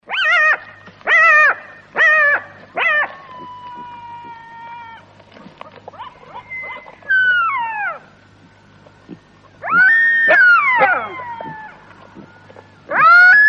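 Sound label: sounds of jackals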